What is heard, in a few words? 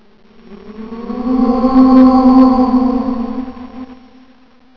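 castle wooo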